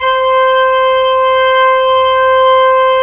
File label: ORG P. ORG; Roland - LA Composer vol. 1